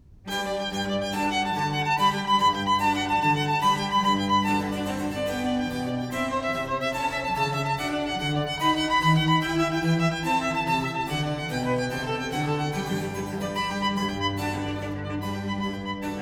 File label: Sonata in G major; Vivaldi